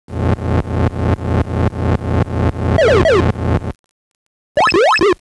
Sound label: BOWLING